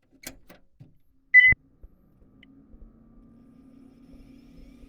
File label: nec pc9801bs2